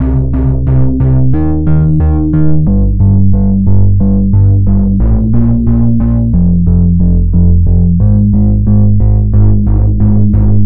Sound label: bass